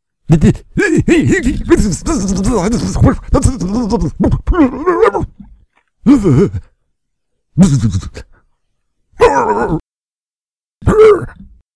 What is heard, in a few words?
Electrocuted